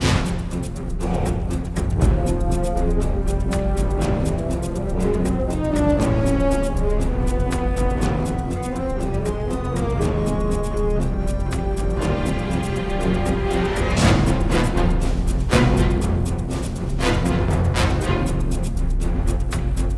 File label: Combat